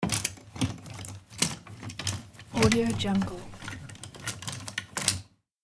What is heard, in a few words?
cabinet hangers for clothes